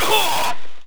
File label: Pain